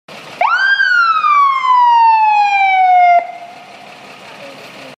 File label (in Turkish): itfaiye sireni